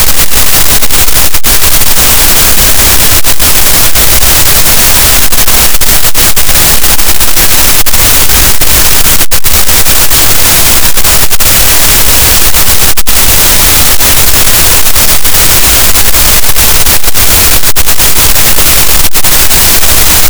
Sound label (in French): cachalot, Physeter Macrocephalus, gaussian noise